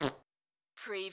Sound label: CARTOON FART SMALL